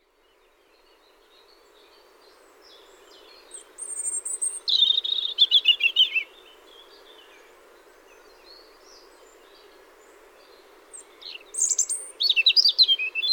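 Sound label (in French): rougegorge, chants d'oiseaux; enregistrés par Jacques Prévost de la LPO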